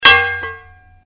Sword Sound; FXHome; Fighting Sounds

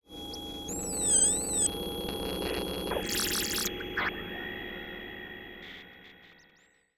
Textures; 99Sounds; The Weird Side Samples

